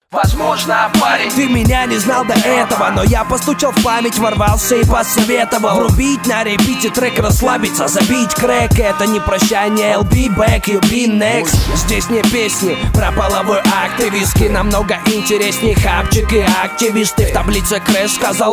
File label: Beat; Crash; BeatFM